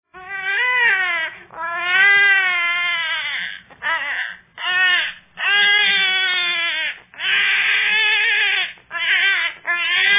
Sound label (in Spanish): Bebe llorando; graciosos